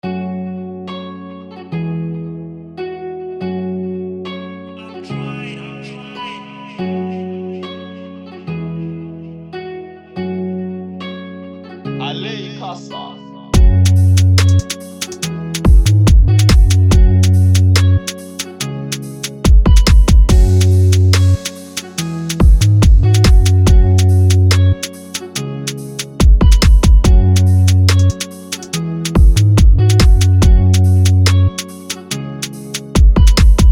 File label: Instrumental